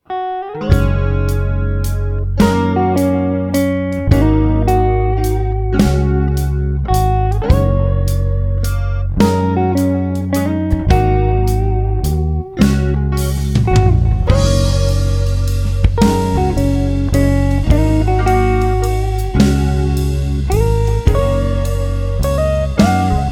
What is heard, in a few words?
major pentatonic, guitar